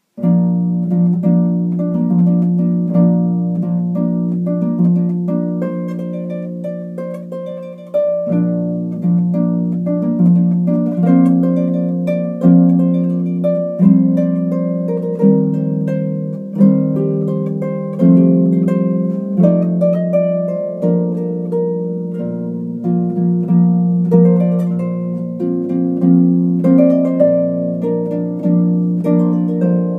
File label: sitar